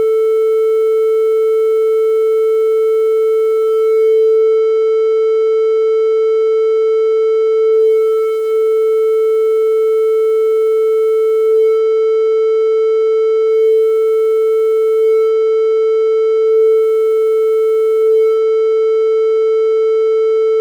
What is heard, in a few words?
Doepfer A-110-1 TL064 vs TL084